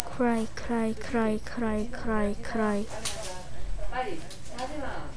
cry

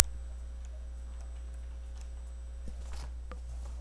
wheel

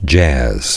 jazz

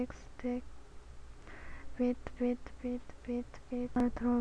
beat

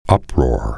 uproar

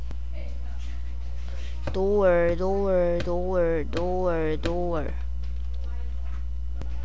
door

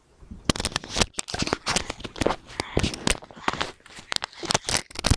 laugh

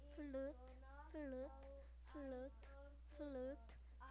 flute

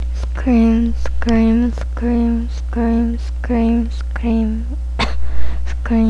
scream

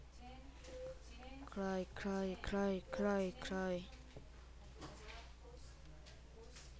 cry

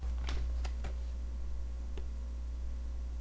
yawn

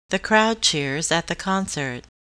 cheer

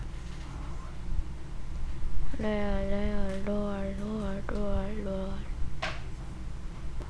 rain

